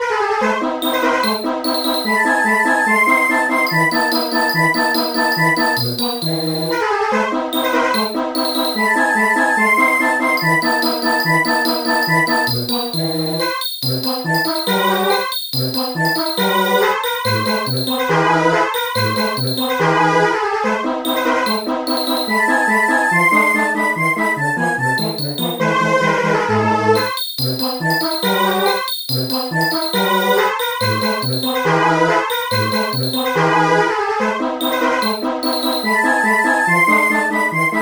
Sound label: goblin